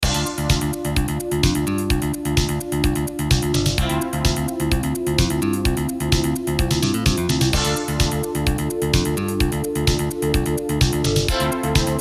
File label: Main Menu AWE32; Rise Of The Triad